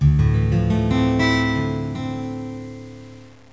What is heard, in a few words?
music; My Science Fair